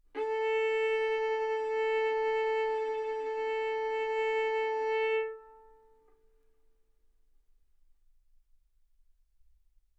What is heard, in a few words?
Violin arco A4 B4; mf; sul A; stereo